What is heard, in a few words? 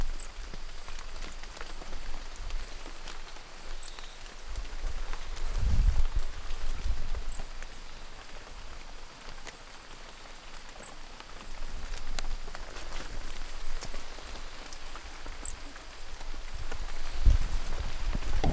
Brachycephalus sp 20-60 cm; Trilha do Corisco